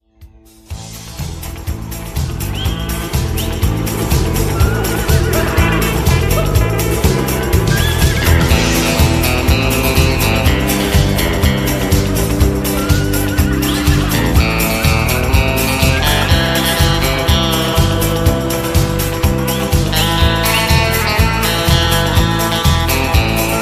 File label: Guitar Instrumental